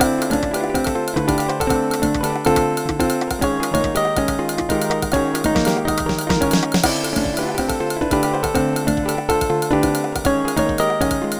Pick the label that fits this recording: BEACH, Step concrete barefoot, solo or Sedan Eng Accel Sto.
solo